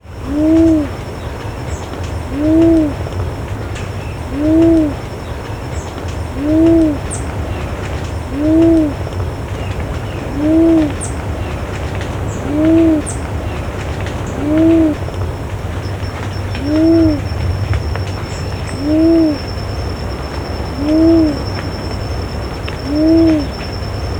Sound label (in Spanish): caminera cabeciazul leptotila plumbeiceps